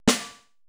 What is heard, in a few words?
80s Drum Set, drumcomputer d3